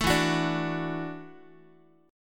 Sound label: E 9th Suspended 4th E down Guitar; E9sus4 strummed; 12,12,9,12,x,x; JGuitar